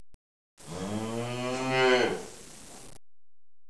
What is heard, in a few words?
COW; animal sounds